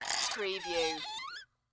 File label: Metal Cabinet Door